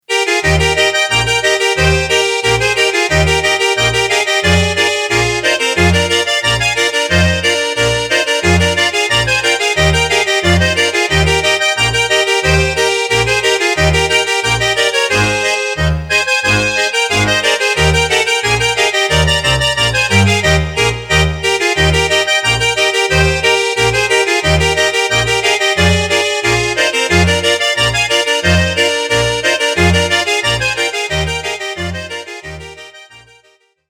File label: Bass Eb